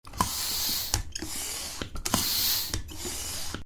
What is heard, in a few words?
Foot pump, Bicycle Foot Pump, Inflating Tire; Bicycle Foot Pump, Inflating Tyre; Vehicles; Bicycles